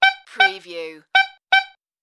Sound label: Toy Car Honk